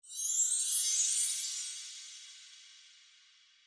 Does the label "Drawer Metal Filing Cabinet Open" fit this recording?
No